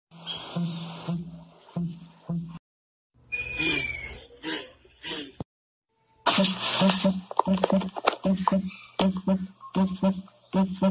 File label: Western crowned pigeon; کبوتر تاج آبی